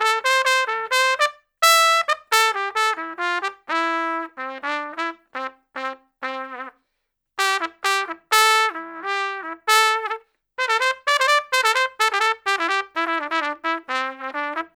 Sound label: Trumpet Solo, Jazz; Traditional; Sonic Foundry (Sony Creative Software); Jazz Solos and Sections